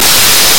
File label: Frog Voice